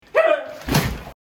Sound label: epic fall